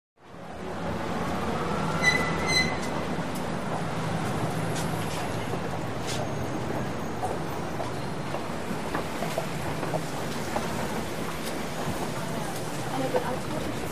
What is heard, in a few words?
City Traffic Heavy; big city